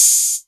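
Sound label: Hat Open, Novation Drumstation; DrumstationV1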